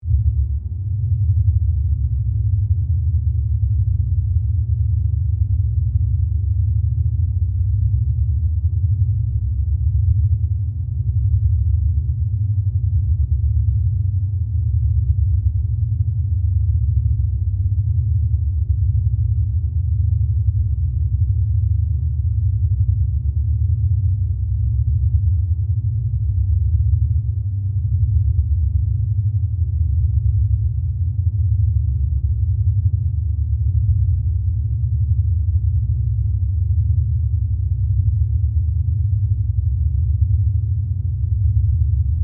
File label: Spaceship Rumble, Scifi, Spaceship, Rumble, Large, Carrier, Craft, Science, Fiction; Spacecrafts; BlastWave